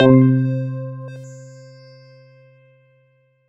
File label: pad C3, PPG Wave 2.2; Pads